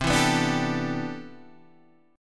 C Major 13th C, Guitar DADGAD

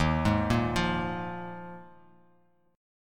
Eb Suspended 2nd Suspended 4th; arpeggiated; chord; 11,11,8,10; JGuitar

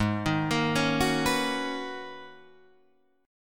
G sharp Minor 11th G sharp Guitar Drop D, chord

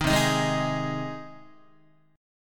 C Minor Sharp 5th C down Guitar; strummed; JGuitar

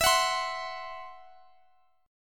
E Suspended 2nd Sharp 5th E, down

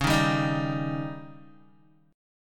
C sharp Suspended 2nd Flat 5th, Guitar; strummed; JGuitar

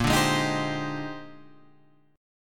Bb 13th, Guitar, Half Step Down

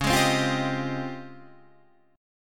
Db Major Sharp 11th, Guitar DADGAD

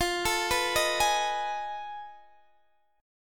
F 7th Sharp 9th Flat 5th, Guitar, Open D

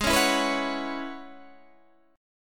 E 6th Add 9th G sharp

